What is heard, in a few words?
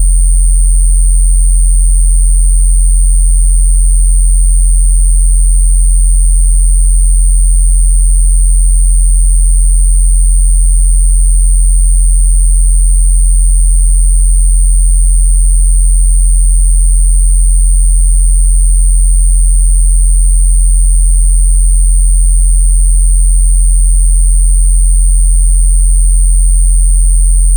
Jack Hammer; Dragon